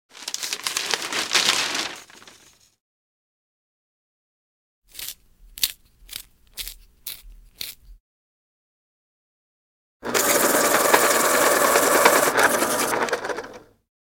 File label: Paper, Pencil Sharpener, Electric Sharpener; 100 sound effects